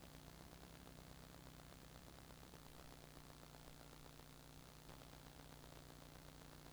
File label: noise; SSL